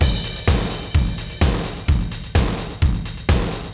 drum loop

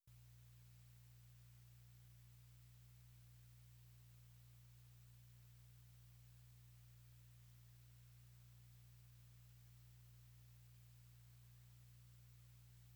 Piano

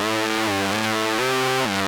mod synth riff

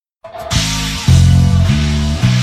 rhythm

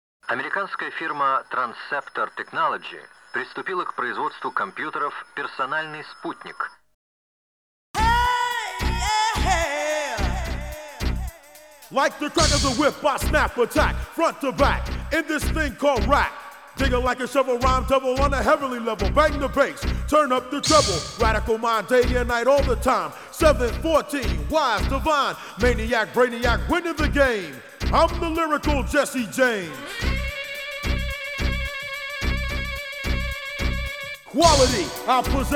Acapella

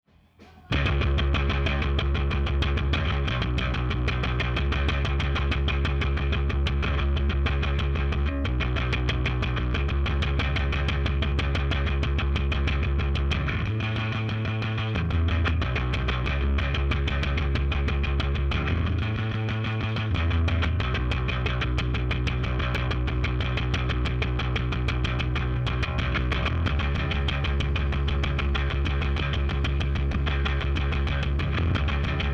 Bass

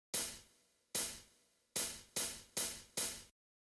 Additional Instruments; Multitrack

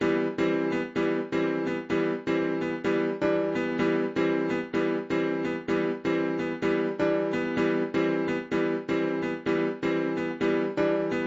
piano melody

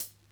Hi Hat OS; Multitrack

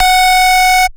F# Hard Melody Synth; Oneshots; Serious Dubstep

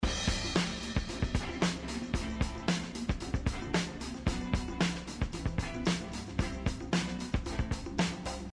Percussion

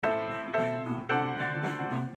Piano

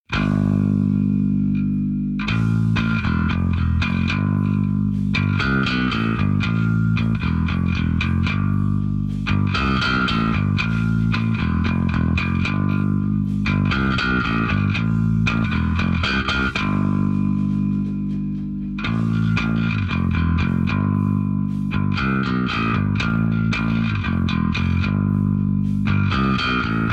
rhythm

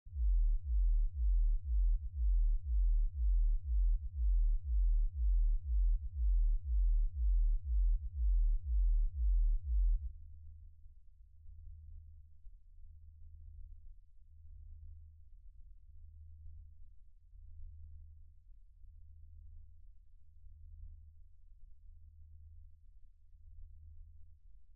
bass; Tron Legacy; Stems

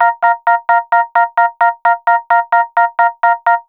Wurly

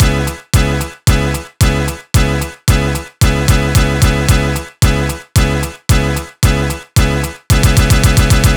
112, Discostep Disco Loops; Pinky

